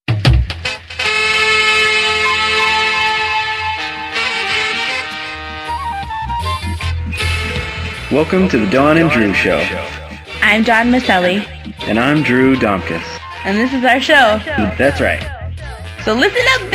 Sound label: dub; puffcast